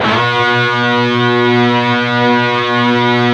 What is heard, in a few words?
PRS PCSC 4-L, guitars; PRS PWR SLID